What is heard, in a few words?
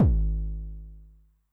BD MOD Overdrive Med, bass drum, 909; classic machines; 909mod